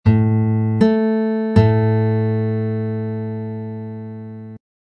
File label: octave, guitar